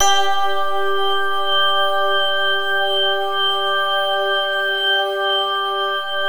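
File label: G3 bell drone; NorthStar - The Wizard VOL-2; BELL DRONZE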